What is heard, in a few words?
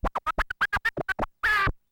Turntables